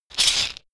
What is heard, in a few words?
shaker wood rough hit double hard